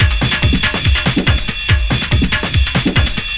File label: Processed Beat, Drums & Percussion